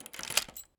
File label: unlock, doors wood; dragonroll